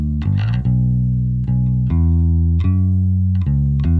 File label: bass; musdb18